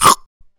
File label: Bite